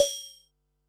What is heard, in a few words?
HH, Pearl SC-40 Hihats and cymbals